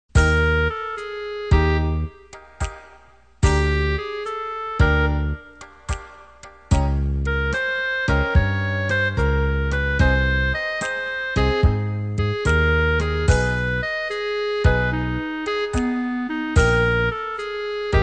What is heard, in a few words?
alto